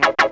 GTR FX; E-Lab; X Static Goldmine 2; akai

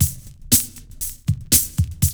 LOOP; E-Lab; X Static Goldmine 2; akai

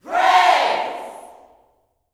"Praise", Vocal Gospel yell shout; Spectrasonics; Vocal Planet Vol.1 Gospel; akai

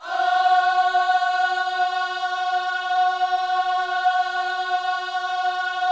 OHS F#4A, gospel vocal; Spectrasonics; Vocal Planet Vol.1 Gospel; akai

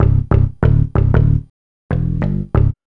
B LOOP; E-Lab; X Static Goldmine 1

